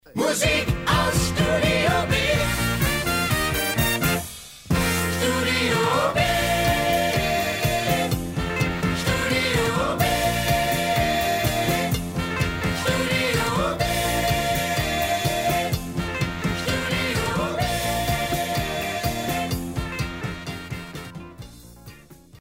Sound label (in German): Musik lang; DRS 3; studiob